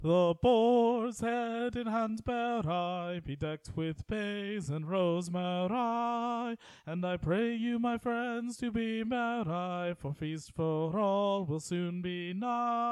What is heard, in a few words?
carol bass; choir